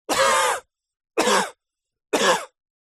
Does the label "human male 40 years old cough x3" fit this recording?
Yes